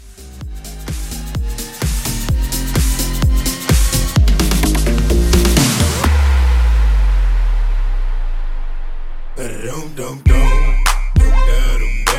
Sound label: 128 CLEAN